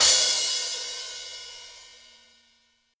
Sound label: Crash